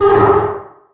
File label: metal frame groan; Source Engine; Portal 2